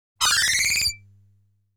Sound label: Beep (Expired)